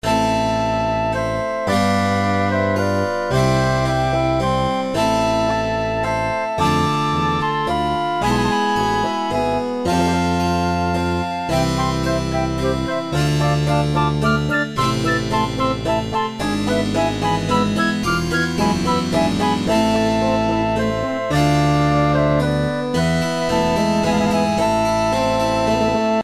Last Fight

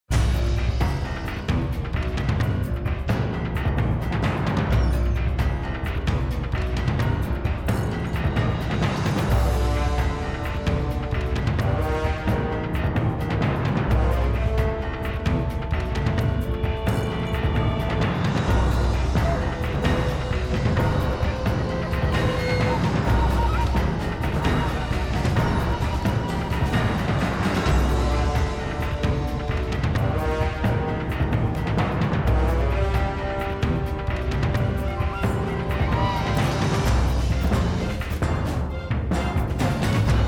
bgm

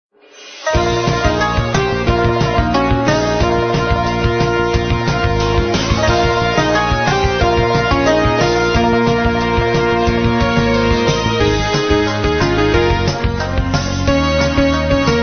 bgm

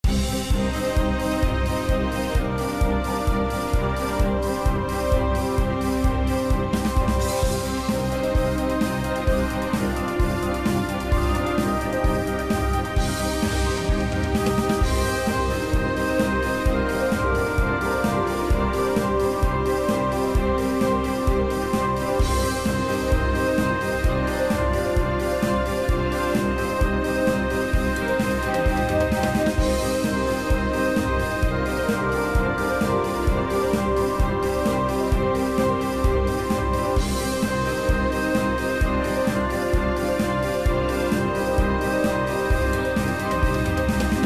bgm

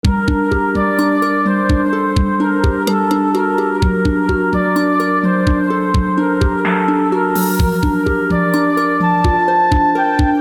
bgm

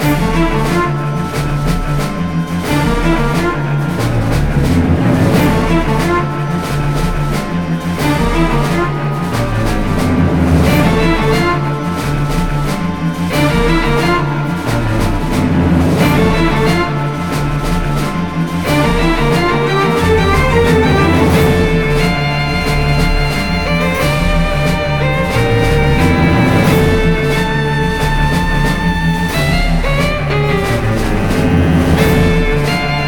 arcade music; Pacificwar

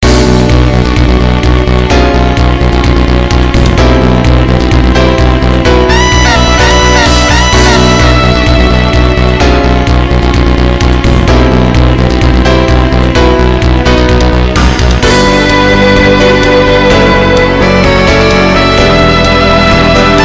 bgm

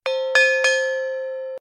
game call

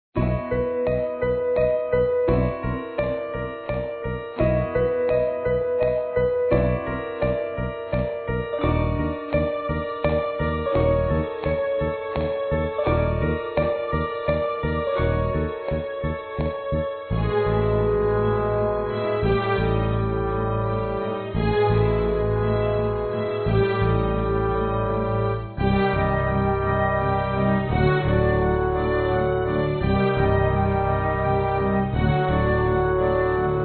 bgm

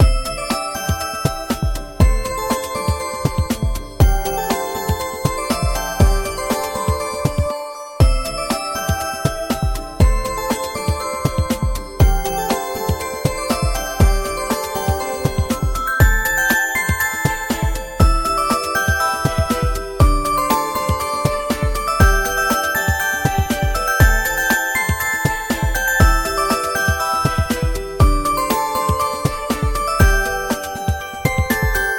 match

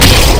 crash

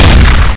big bang; LiveOrDie

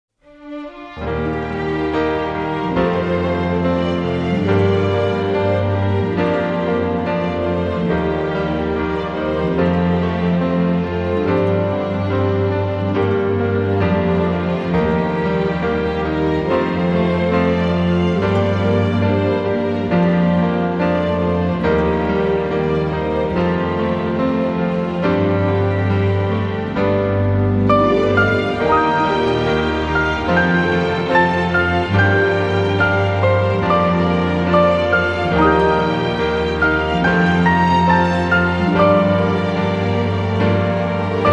bgm

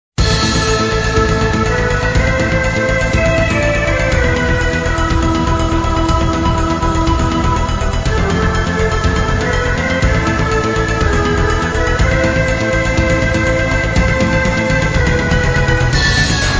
bgm

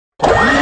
minigun On

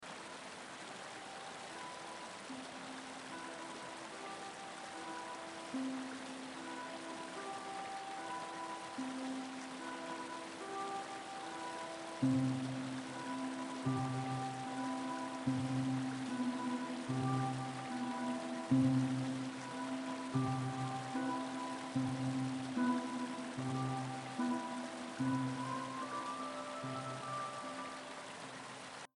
rain; minecraft

